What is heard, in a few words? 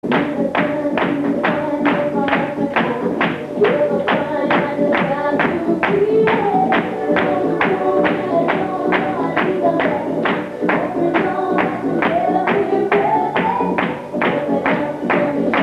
music